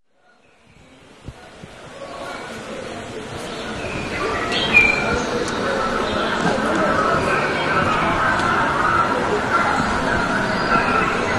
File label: sounds nature; Mash Pequot